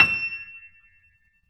E 6, Keyboards Acoustic, GRANDP ST; USB Soundscan vol.17

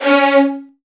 Virtuoso Strings; ILIO; Kirk Hunter Virtuoso Strings VOL-3; 90 s Sample CDs; LRGE STR HRD

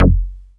MINI Q PLK F#1, MINIMOOG; Big Fish Audio - First Call Vintage Keyboards

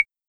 Clave, Roland TR-77; Drums

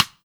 stick; Funky Ass Loops; East West

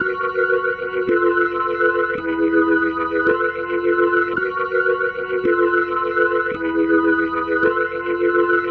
Psychedelic, Organ, Loops

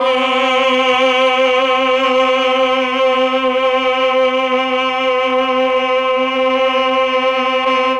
CHOR; ILIO; Propeller Island Legendary M400

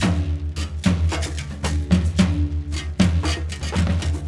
Bag Pipe Groove, Rattle, Brekete, Djembe; Berimbau

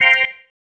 Stab One Shots, Organ

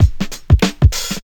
house loop

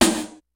FUNK, DRUMS; Kid Nepro; Elite

